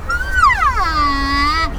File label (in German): Kommunikation Nashoerner whine; Breitmaulnashörner (Ceratotherium simum simum)